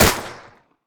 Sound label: fire; gmod; arccw uc commons attachments